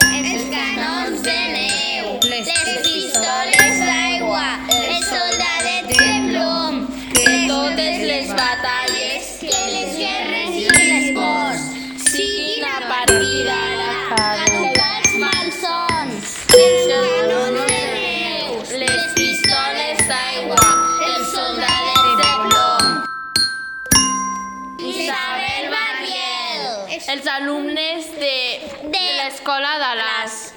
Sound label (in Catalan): POEMA MUSICALITZAT